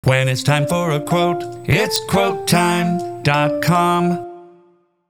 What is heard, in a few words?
Voc Marimba Harmony; QuoteTime